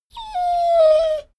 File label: dogo argentino whine short